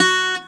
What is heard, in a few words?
C GTR SOFT F3; USB Soundscan vol.42; AKAI